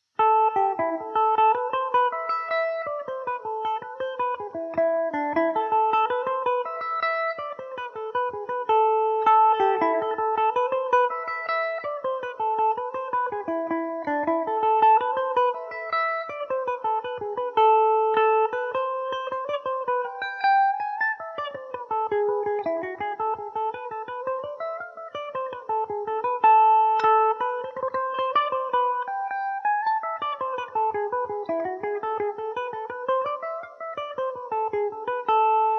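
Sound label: A minor, Irish mandolin; coleraine jig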